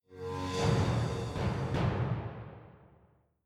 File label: jingle quest accept; Sentry Knight Tactics